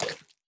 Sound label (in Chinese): 犰狳进食声